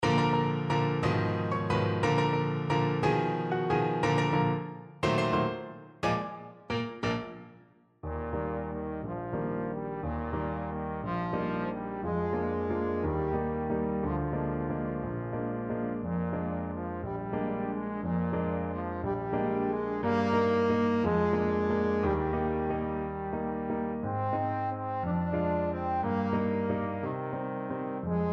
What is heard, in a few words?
merry widow waltz, trombone